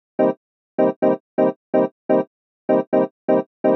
126 C MUSIC; funky; Loopmasters; Funky Underground Vol 2

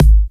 Kick

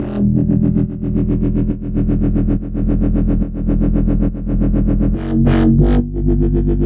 140 E BASSLINE, Dubstep

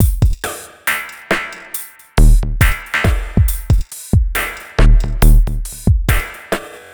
Bpm138 Tribe Break, Dubstep, Drum Loops

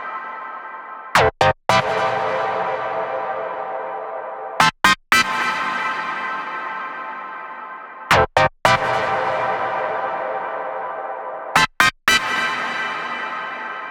Bpm138 Chord Loop Am, Dubstep Chord Loops; Onyx

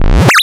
The Coil; Fx; Loopmasters; Jon Carter & Alex Blanco; Block Party Essentials

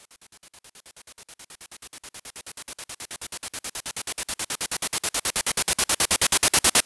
140 FX LOOPS; Loopmasters; The New Sound Of Dubstep

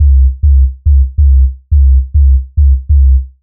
140 C BASSLINE, Dubstep

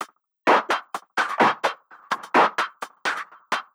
Loop, 128 BPM

